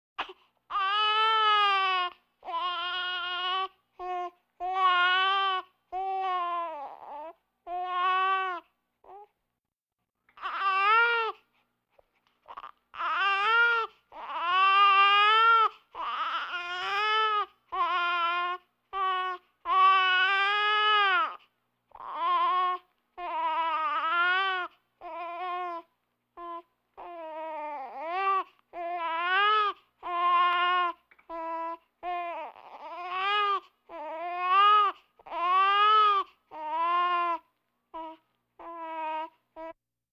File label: Vocal effects breathing Moaning Baby; Valentino Production Sound Effects Library CD50